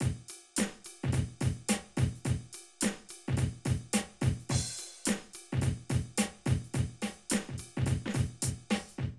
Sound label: Drum loops, Streetsoul